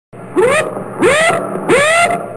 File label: WW2 Destroyer siren